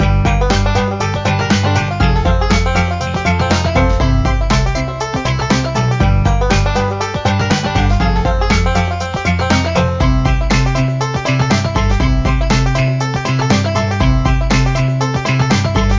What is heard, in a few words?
Dance; Talking Pato; Android iOS